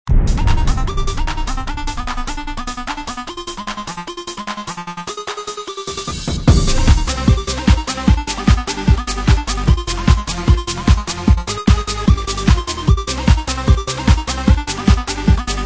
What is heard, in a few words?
TECHNO; jungle jiggy